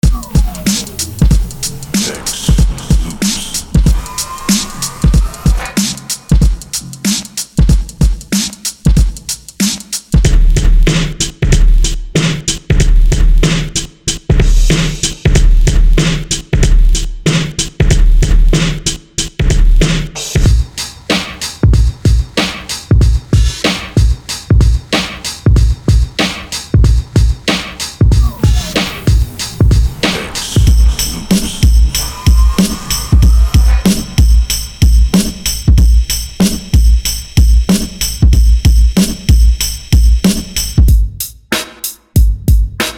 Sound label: hiphop drummer